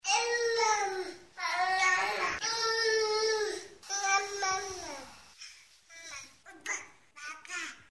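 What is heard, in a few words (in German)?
6 Monate, Tonbeispiel, Sprache, kann lallen, macht Lippengeräusche; • Austauschspiele werden gemeinsam entwickelt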